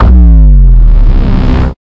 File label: ambient space gravity